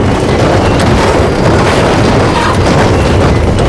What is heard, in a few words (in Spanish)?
IMPACTO BARRANCO; AOS F1 2022